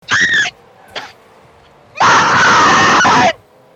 screech

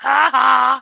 haha

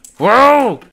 woah

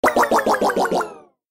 funny system break down; funny sound effects

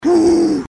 uhhh

